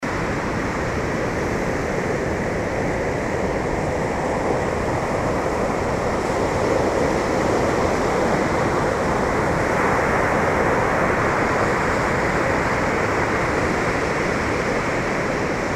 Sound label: outer banks, carolina coast surf